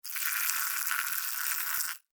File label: Beetle, Angry, Buzz, Wings, Flap, Insect, Bug; Beetles; Sound Effects:Animals:Insects; Blastwave